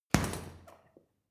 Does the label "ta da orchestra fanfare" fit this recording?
No